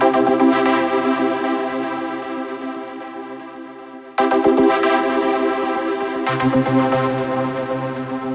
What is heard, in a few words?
Phasing